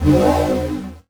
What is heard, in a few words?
Flash Blurp; Multimedia Internet CD-Rom Flash